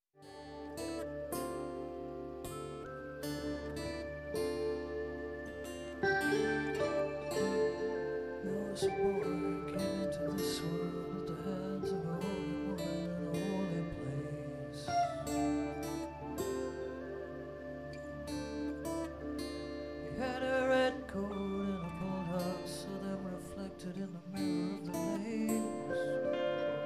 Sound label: Acoustic